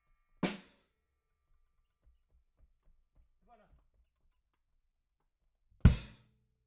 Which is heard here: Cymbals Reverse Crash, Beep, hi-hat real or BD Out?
BD Out